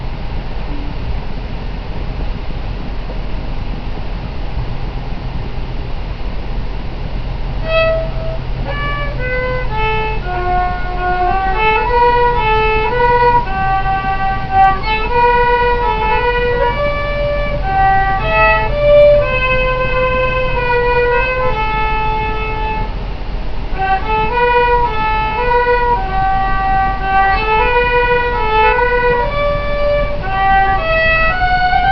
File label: kids run through violin terrible